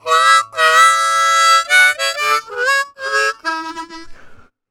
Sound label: Harm 098bpm A, Harmonica